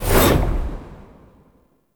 Perfect Heavy Attack Proc, Weapons Melee

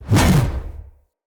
Spear Whoosh; Weapons; Enemy; Spears; NimrodSpear

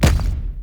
Obelisk Stone Connect

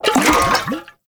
Alchemy Grenade Aim; Entrati

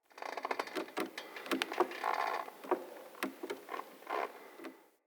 Tent Rope Creak, Interior; Soul Plane

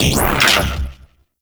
battery activate; Cowgirl

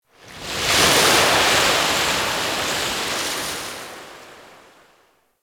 Beach Wave Crash; Warframe; Grineer Beach

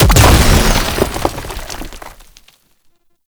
Portal Shatter Deactivate; Warframe; Duviri Murmur; Ambience

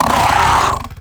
Combat Vocal Attack Medium; Warframe; Catbrow

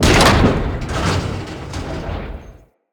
Ship Hull Impact Medium; Vehicles; RailJack; RailJack Hit Effects

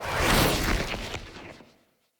Fenn Casting Device Projectile Whizzby, weapons; Player; Casting Devices

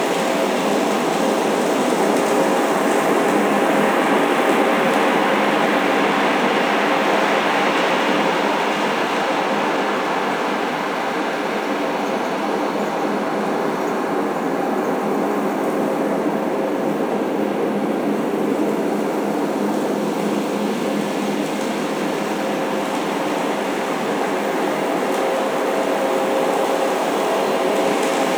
Galleon Interior Ambience; Warframe; Grineer Galleon

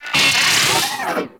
Amalgam Moa Vocal Death

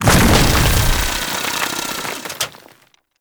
Ghoul Burrow Exit; Warframe; Grineer Ghouls; Ghoul Drill Sergeant

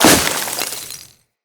Melee Impact Snow

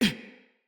Pain, Battle Barks; Envoy

